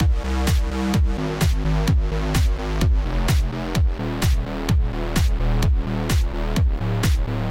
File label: sidechain all